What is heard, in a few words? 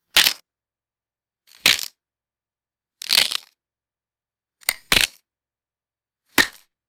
short crisp vegetable snaps and crunches